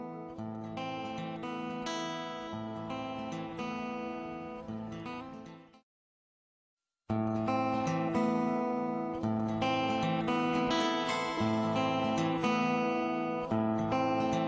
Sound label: Algerian Instru